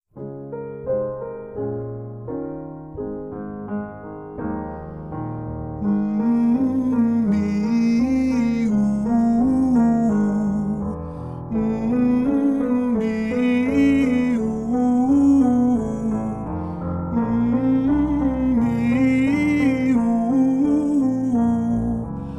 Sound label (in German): Warm up tief; Einsingen tiefe Stimmen; Stimmbildung